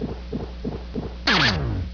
choices

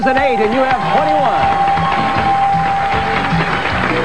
dings; usa

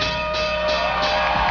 times up

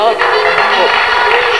correct; lingo

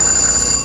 buzz in

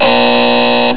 buzzer

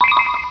buzz in

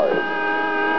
buzz in

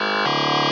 wrong; usa

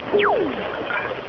fire spell

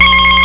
ring in

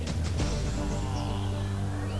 wrong

